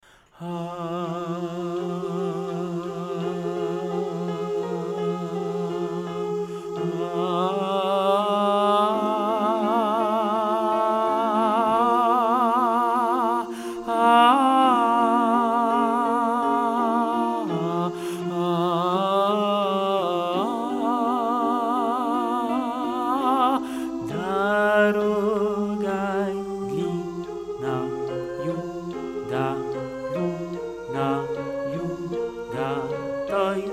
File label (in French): hommes